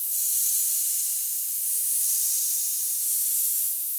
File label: Sample n Hold Verb Loops, sparse soundscape